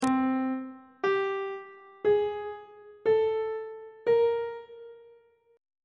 d double flat 6th interval pitches; treble clef

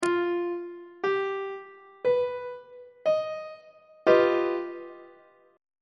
f double sharp augmented 7th 3rd inversion; on treble clef

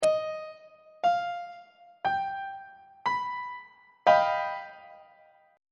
g augmented 7th 2nd inversion on treble clef

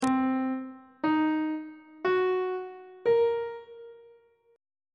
c half diminished 7th chord intervals; on treble clef

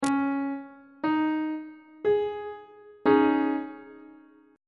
d flat suspended 2nd chord on treble clef

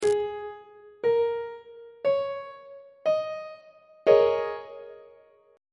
e flat dominant 7th suspended 4th 1st inversion; on treble clef

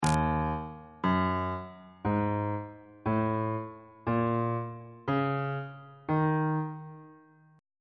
e flat blues scale

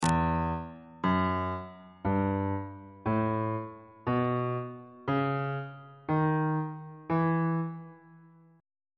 e melodic minor scale note positions on bass clef

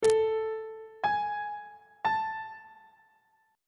a dim8 perf8 intervals; treble clef

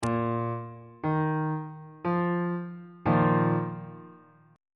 d sharp suspended 2nd 2nd inversion

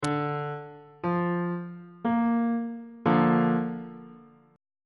b flat major 1st inversion on bass clef

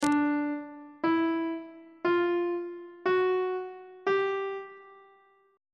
d 3rd interval pitches on treble clef

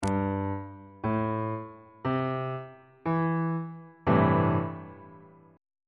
b sharp major 6th 2nd inversion

